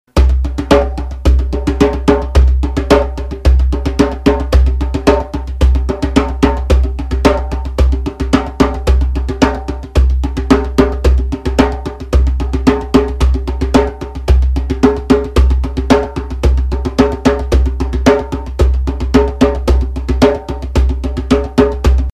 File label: Djembe